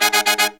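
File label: Pop & Funk Brass, 110 Ab, right; Best Service ProSamples vol.25; AKAI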